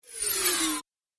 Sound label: Arcade Game Powering Down; Video Game Sound Effects; Retro Inspired; Nintendo, Atari, C64